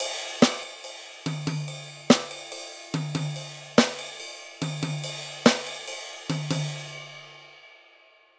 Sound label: swing example